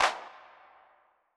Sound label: percussion, percusson samples; RockScience